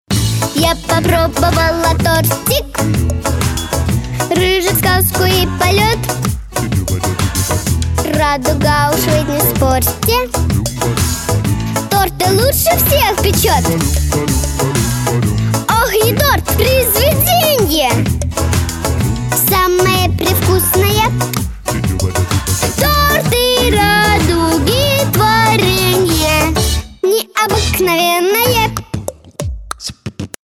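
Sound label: 11 years vocal, Russian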